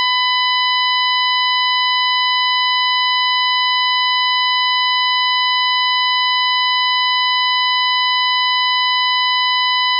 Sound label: Multitone